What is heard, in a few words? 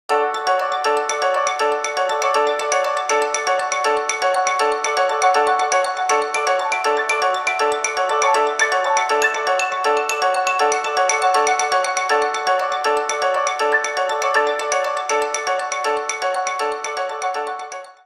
Ring Fractal; HTC Touch Pro2